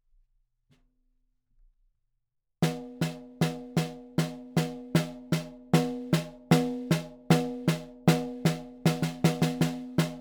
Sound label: hot licks slow